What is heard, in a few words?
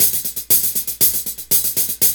LOOP; E-Lab; X Static Goldmine 2; akai